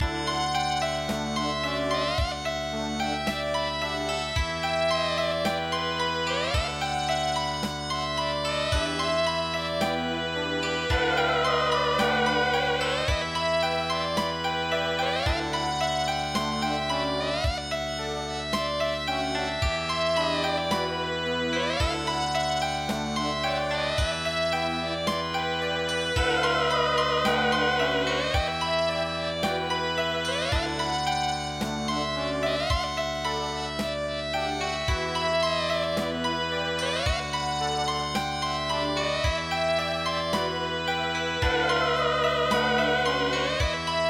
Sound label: Erhu Music